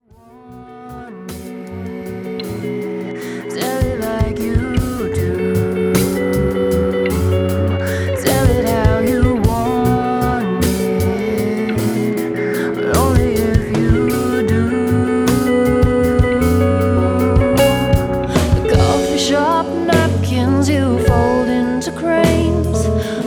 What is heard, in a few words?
Indie